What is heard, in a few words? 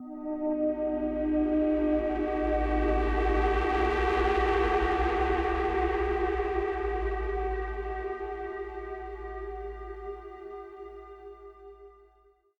Tubular Action End; Scourge Outbreak